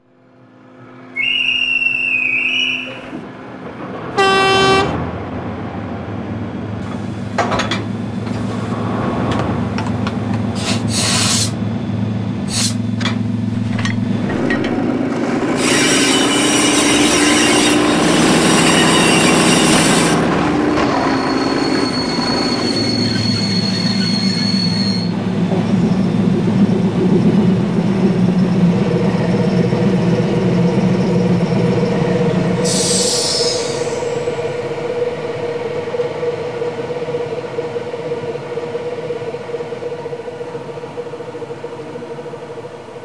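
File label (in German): Hörprobe Reihe 2045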